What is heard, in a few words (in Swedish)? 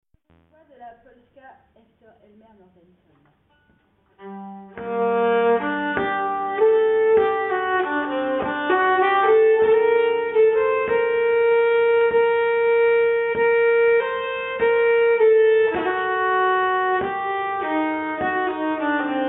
Polska, nyckelharpa; Polska Normasson